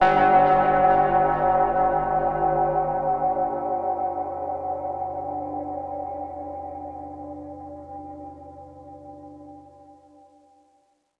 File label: Game Over